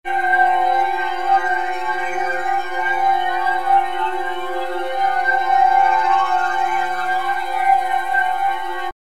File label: harmonic event; flaechen